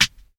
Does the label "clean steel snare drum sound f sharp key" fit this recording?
Yes